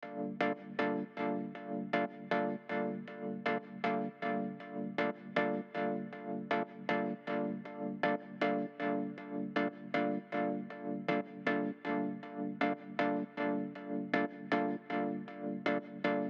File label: Synthesizer loops with multi-effects; Deeplastik